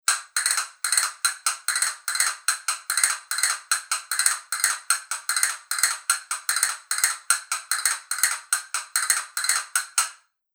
castañuelas madera roja veteada

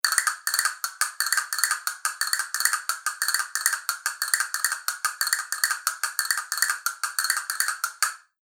castañuelas fibra concierto doble caja

